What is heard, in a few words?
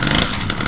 chain holster